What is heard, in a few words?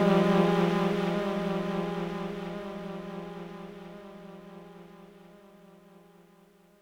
Memory Foam Trumpet G2